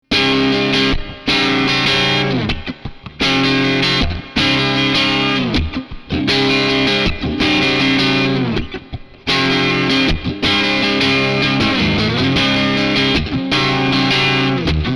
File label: Crunch Chords; iRig UA